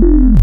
Harmonics Sines; Ableton Live 12 Lite; Vector; Sprites

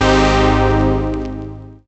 Synth Crackle AbMaj7

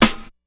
Snare Grit, drums

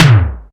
Tom Electronic; Drums

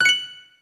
coin; PlaydateSDK; Level 1-1

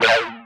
E-Perc Weouh, Electronic Percussion; Drums; Ableton Live 12 Lite; Core Library

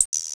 delete; Quicken

